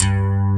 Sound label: AC GTR, JV 1080; Pro-Rec - Synthsonic